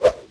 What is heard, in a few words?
gaffi swing; weapons